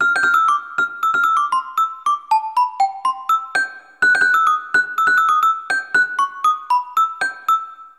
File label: Celesta; Smeshariki Chiko's Computer